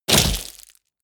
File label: body fall gravel heavy; krieg-waffen; nahkampf; soundpool